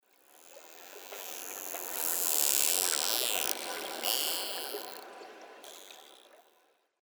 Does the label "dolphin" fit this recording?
Yes